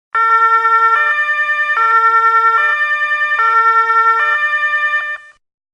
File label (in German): blaulicht ringtone